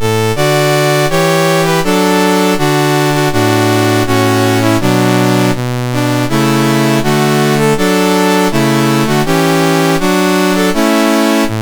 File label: Faroe Islands, National Anthems, MOS6581R2